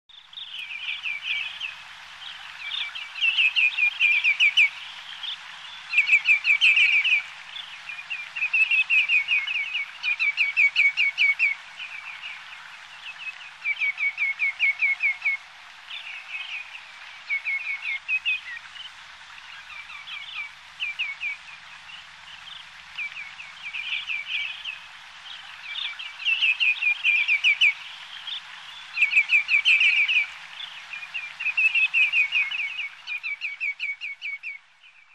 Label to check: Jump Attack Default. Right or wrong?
Wrong